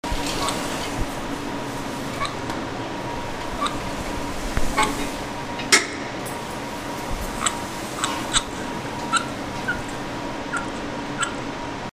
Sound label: Squeak